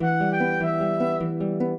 minuet, flute harp; Mozart

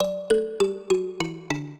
minuet, mbira; Mozart

